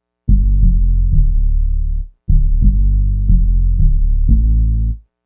Synth Bass

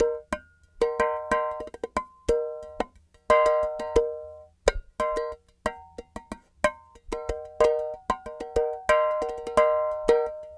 clay pot, world percussion

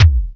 kick synth; E-MU Formula 4000 Series Vol. 2; OrbitPercMaster

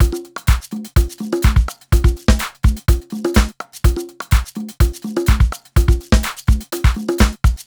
top full, 125 Beats

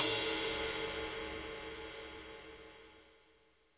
Cym; Roland R-70

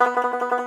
T. BANJO, Tenor Banjo, Plucked Strings; E-MU Producer Series Vol. 7 – Old World Instruments

